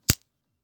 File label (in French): Briquet clic; Bruitages